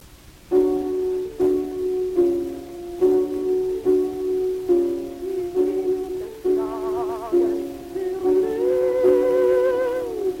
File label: Gramophone